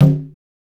Lo Conga; VANITY KIT

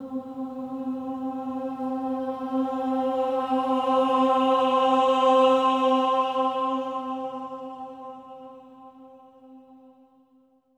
AH C4, swell ahs, Symphony of Voices; Spectrasonics

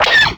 EMPIRE LASER; Graz

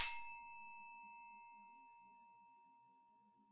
TEMP B6, temple bells; SoundBytes - Early Patches

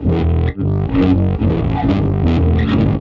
Processed Electric Bass

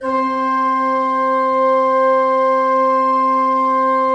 16-8 C4, Cathedral Organ; Propeller Island

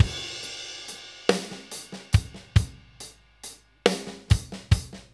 6/8 Loop, Drum Tools, Laid Back

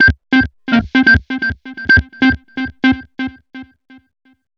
127 sound 1 L, Fresh Disco House; USB Soundscan vol.20; AKAI

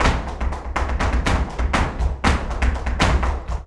BULERIAS TAP, Flamenco

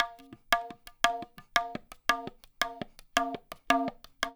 fill, percussion

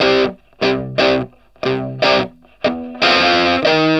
Vintage Blues Guitar, 60, C; USB Soundscan vol.22; AKAI

